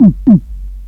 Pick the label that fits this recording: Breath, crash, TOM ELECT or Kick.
TOM ELECT